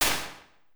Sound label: Eastern Club, Impulse Responses; Small Spaces; Indoor Spaces; Apple